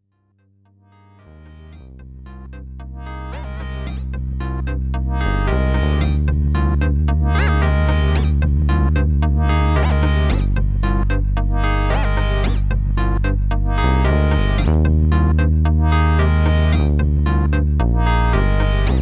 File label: Korg Minilogue Motion Seq